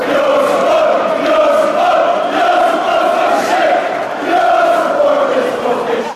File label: your support is fcking shit, chants